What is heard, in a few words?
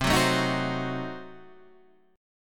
C Diminished 7th C down Guitar Standard; strummed; JGuitar